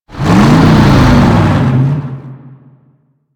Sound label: Lasrian APC Move Start; Enemies; Warframe